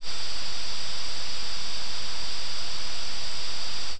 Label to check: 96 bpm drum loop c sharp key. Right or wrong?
Wrong